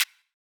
Klap, drumkits; fl studio; dstarkels plugnb

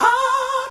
Vox Aaaah! Roland M-DC1; drumkits; FX + Misc; fl studio; young kico drumkit; Chronicles Of The Atlantic Trap (Sound Kit)

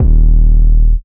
808, D#; drumkits; fl studio; bvker drumkit; Born 808

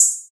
small openhat; drumkits; openhats; fl studio

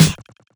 snare; goodtakimu drumkit; fl studio; drumkits

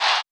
recycled chant vox; drumkits; voxes; fl studio

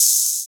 Open Hat, Cymbals; drumkits; fl studio; bvker drumkit

